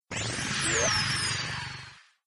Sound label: win; neon road